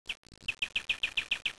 AMB

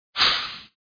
lift open; titans; beast boy

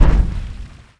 Gibb Meat Hit Ground; Defiance

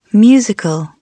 musical

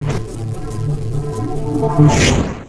wormhole creation short; wormhole creation level 1

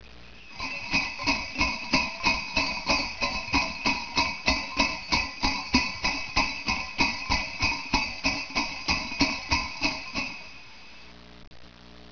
sleigh bells

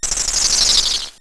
Fade; Treasure Galaxy (1994); Learning Company

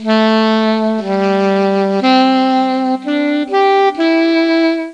Sax; PUZMANIA

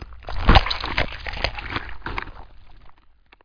HEAD RIP; Duke Nukem 3D Kill-A-Ton Collection

